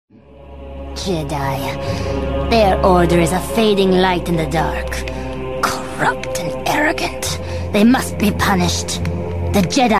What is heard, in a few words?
oppo x ringtone